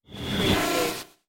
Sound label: robotic interface message